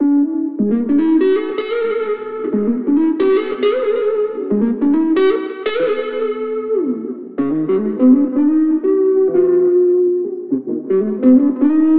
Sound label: Chill Out Riff